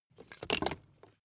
wood logs small fall

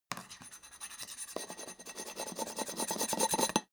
clean metal rub long

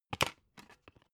dvd case remove dvd

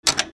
mechanical switch

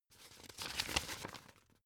old flip page

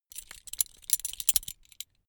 metal belt buckle clatter long heavy

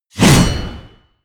blade whoosh large

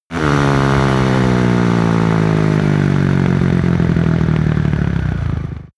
motorcycle motorcross decelerate

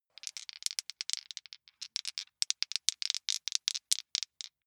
big two rattle in hand long

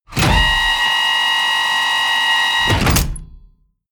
tank medium turret rotate

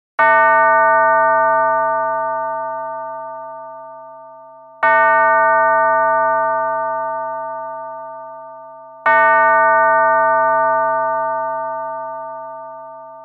church bell time

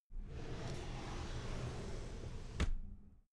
drawer cabinet paper open soft

